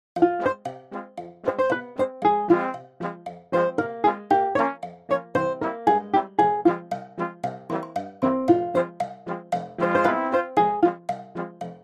Less Brass

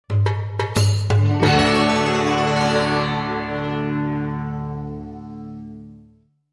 Hit

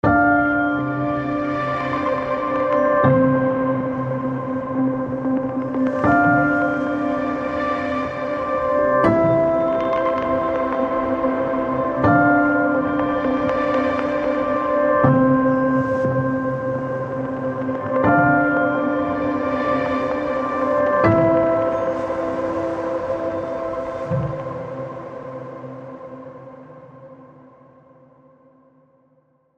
Heavenly Keys Pulse

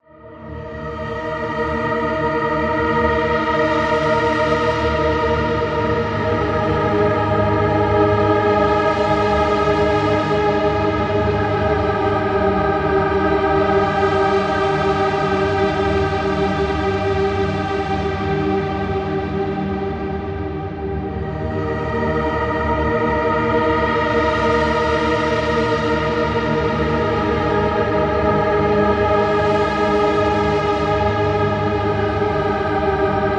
Heavenly Voices Choir Pad

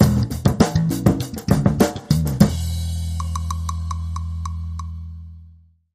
Organs, Clean Sting

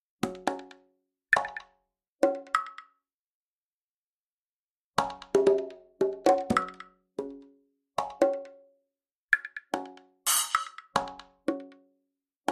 Underscore Perc

